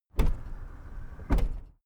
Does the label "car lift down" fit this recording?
Yes